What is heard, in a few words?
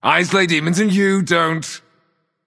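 tongue, non-verbal; smite; voicepack; merlin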